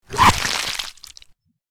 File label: Vampire bite aggressive attack